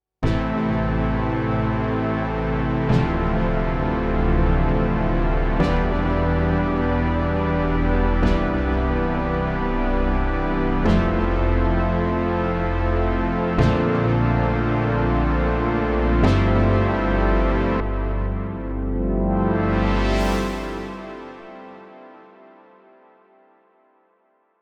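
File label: Moog Synth ECHO Stereo; PSP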